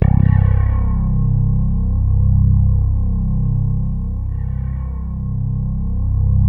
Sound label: C1, SynthLine